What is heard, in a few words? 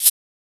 Shaker; FLPRO